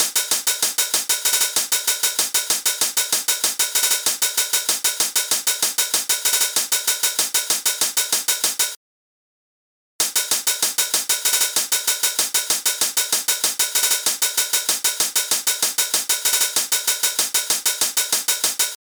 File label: hihat